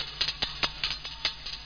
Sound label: clicky; SpecOps2MPlayerDemo; Music